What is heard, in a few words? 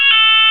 french fire alternating, fire alarm; garrysmod